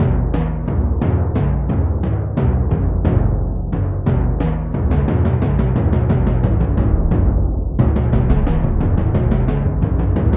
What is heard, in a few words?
Drum